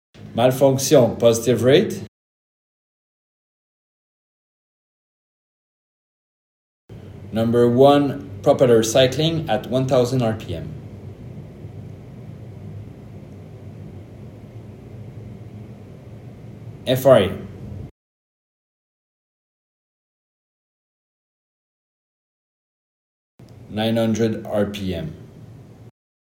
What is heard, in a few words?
Propeller Cycling at 1000 RPM